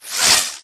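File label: Drone Movement Turn; Titanfall 2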